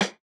hiphop snare, Effect on

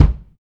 kick, house

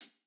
Jazz hop hihat

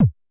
Korg kick

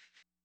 swing shaker; Hiphop

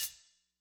hihat; pasific; Pop

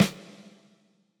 hall resonance snare

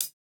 hihat, house

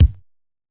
Hiphop kick; Reverb+EQ+Comp+Limit

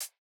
Modern hihat, Electro

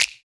Electro clap; Reference Beat

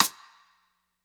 rim; Pop; funk; funkvol1

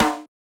snare, snare house

